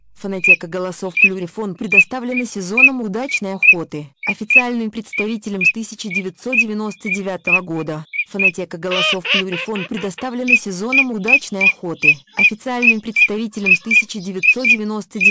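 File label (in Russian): свистунок, манок; plurifon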